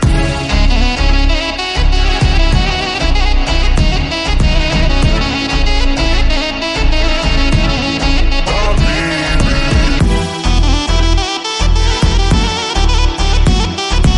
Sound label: arabic musical tone ringtone